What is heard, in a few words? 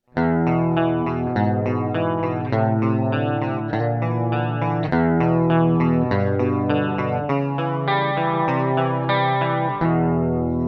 metal prog; fretjam